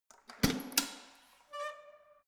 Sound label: letterbox metal unlock open pull